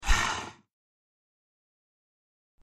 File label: Slow Trackball Spins, mouse